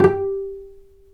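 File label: String, cello staccato, dry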